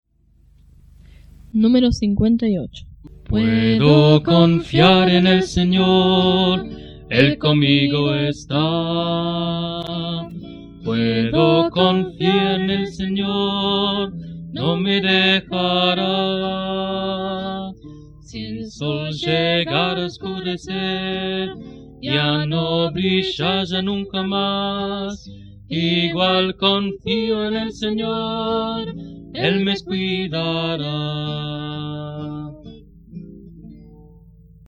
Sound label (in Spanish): Coro, himnario niños